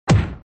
男主战斗打击

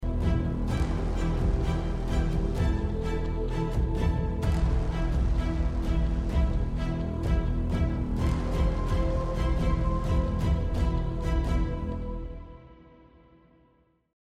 战斗背景音

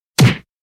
踢人

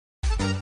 单次点击

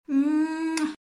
亲嘴

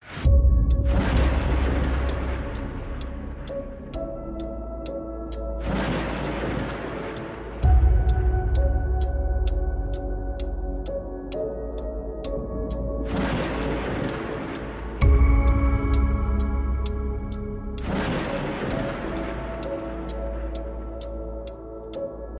引导剧情背景音

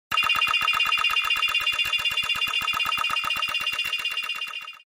转盘抽奖音效

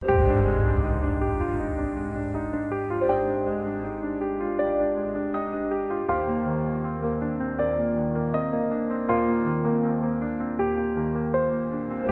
房间背景音乐

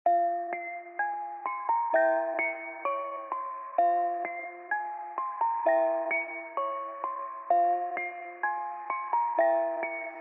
废弃基地背景音

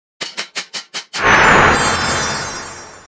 锅飞出

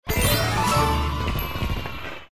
20级音效

